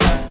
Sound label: black punch, Punches Hits; Punches Hits Discoblasts; single hits